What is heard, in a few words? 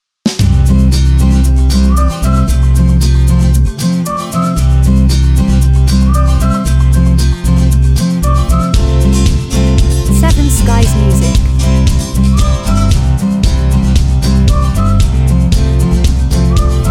Easy Going commercial